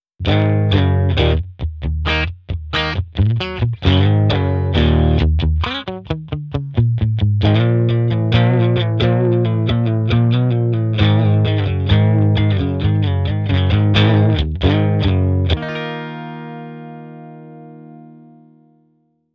Blues OD Rhythm FX OFF

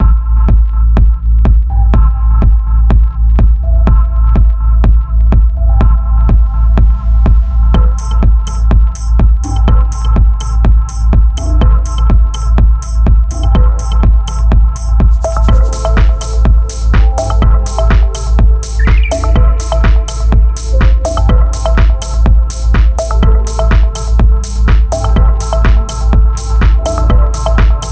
Dub and Dark

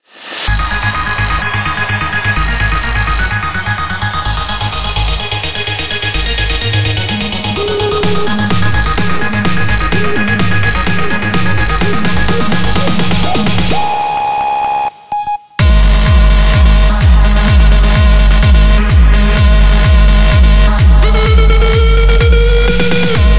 Synths EDM DOWNBEAT; Zampler Ultimate Synths

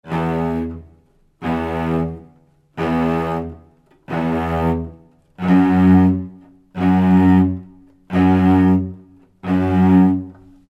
180 Em Cellos Pulsing

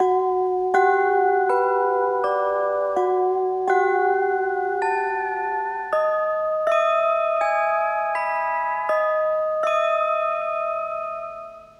Cassette Mallets

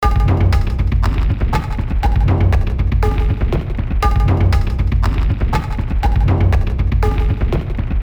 Processed Percussion, 120

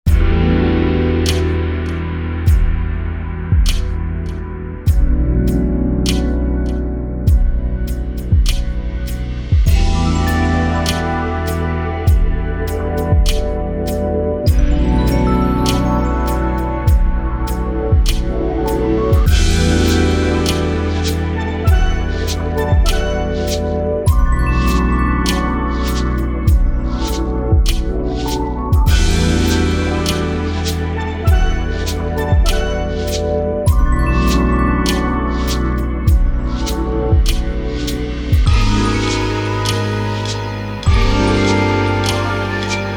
Khords

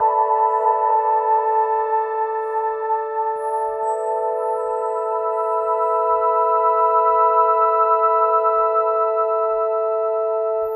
G Adrift Lite Pad

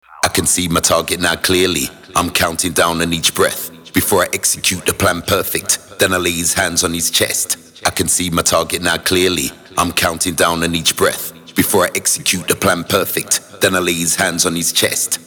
126 F Vocals

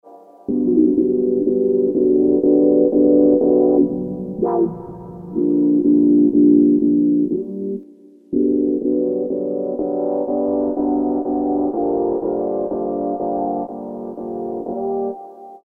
123 Em Chords Syn

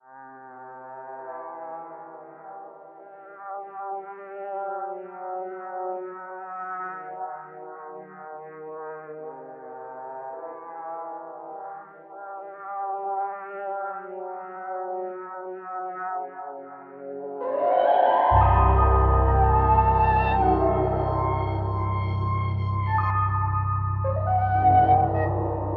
cassette piano

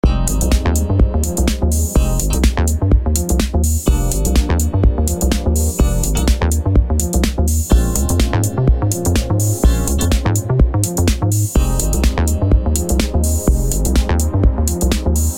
Smooth groove

